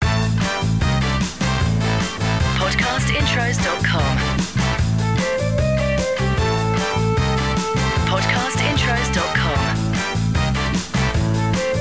LOUNGE SWING; Podcast Watermark

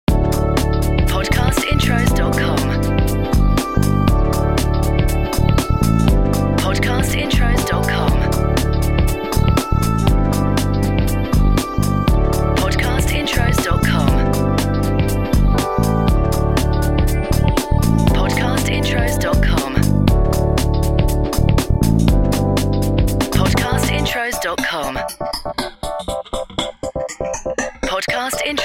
CHILL OUT Feel Good; Podcast Watermark

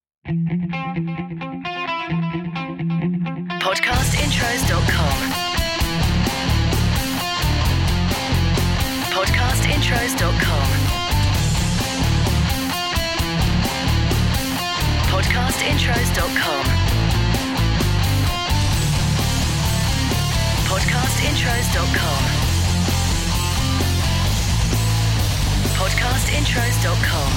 Podcast Watermark ROCK

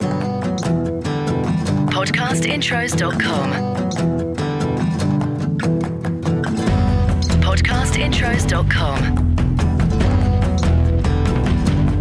ACOUSTIC; Podcast Watermark

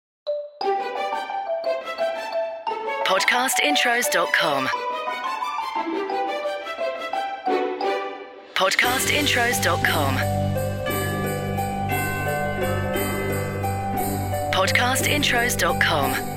CHRISTMAS We Wish You a Merry Christmas; Podcast Watermark

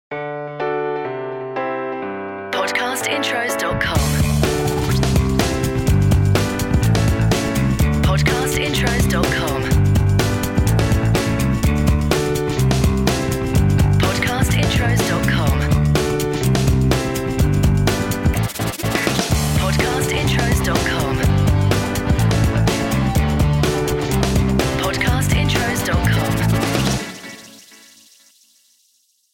CORPORATE; Podcast Watermark

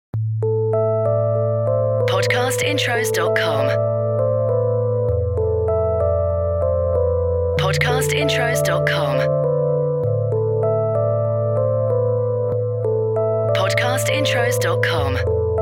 CHILL OUT; Podcast Watermark

